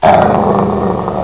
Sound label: were alert, werewolf